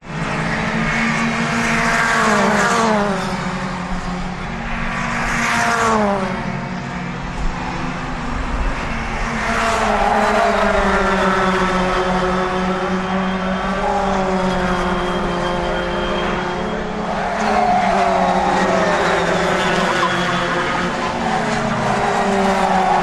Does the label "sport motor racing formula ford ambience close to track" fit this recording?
Yes